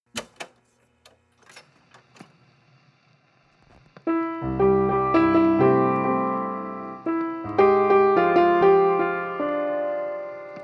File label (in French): Piano Fantome; Bird People